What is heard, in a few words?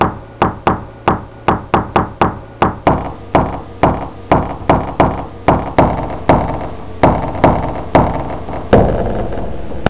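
long knock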